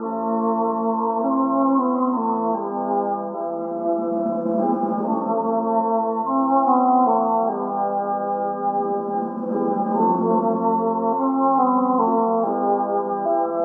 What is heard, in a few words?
horns, Loops